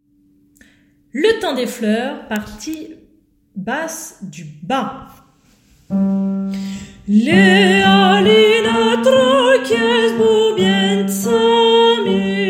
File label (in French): partie basse du bas